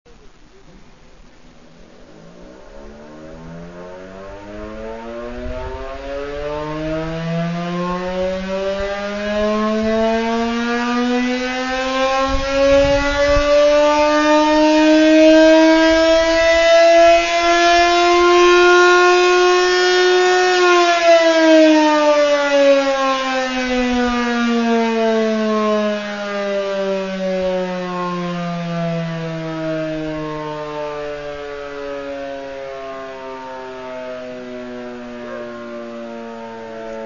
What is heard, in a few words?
sirens; park city utah